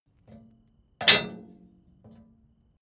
metal impact bright short loose

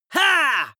male fight shout; comedy-cartoon; soundpool

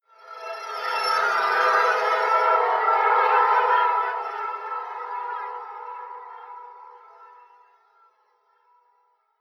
swarm

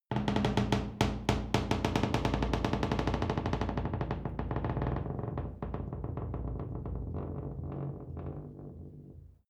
wood door creak close slow

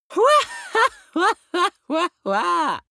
laughter prig; vocals; comedy-cartoon; soundpool

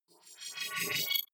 wobble hover swell tonal; energie

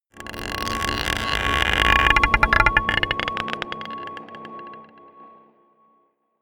scrapes marble pot dark, drones

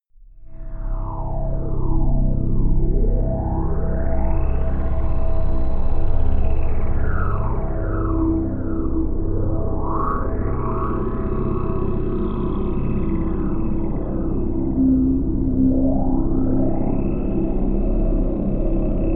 drone circular

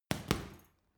boxing punching bag hit double creak; sport-freizeit; boxen

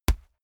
boxing gloves batter hard

